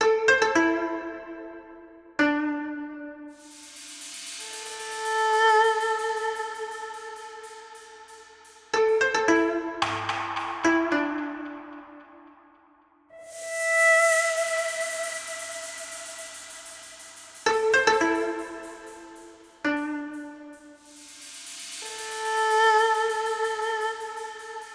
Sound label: Loop